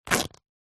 fabric cotton rip; foley; zapsplat

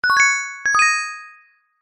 multimedia game sound gem treasure found

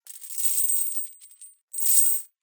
Just Chains Pick Up Drop; soundbits

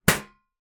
impact metal lid file box down hard

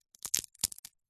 food papadum snap break

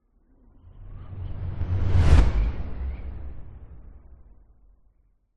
sound design whoosh impact hard wind; zapsplat

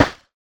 Open; little robot sound factory

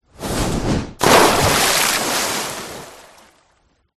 impact object fall whoosh into water splash

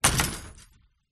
household gate metal flimsy close

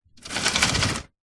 household clothes airer metal drag concrete short; zapsplat

